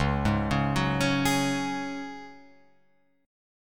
Db Suspended 4th Db down Guitar Open F, Dbsus4 chord; arpeggiated